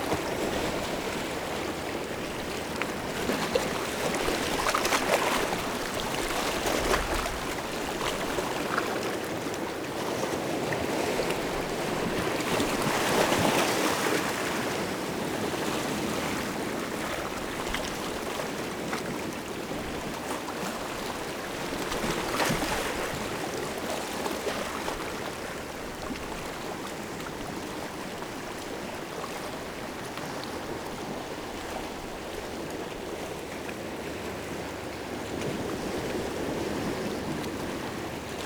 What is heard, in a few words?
Shore Waves; BoatAttack; Water